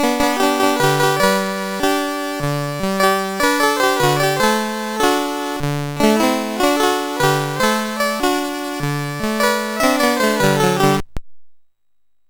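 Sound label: Pancho, MOS 6581 SID chip music, games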